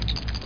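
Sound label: com button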